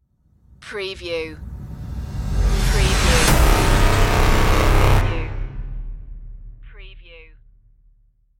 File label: colossus stinger